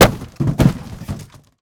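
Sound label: destroy cardboard large; Explosions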